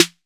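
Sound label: SNAPPY SNARE; Northstar - New Gold VOL-2; RAP CITY 1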